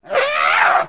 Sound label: leap, fast zombie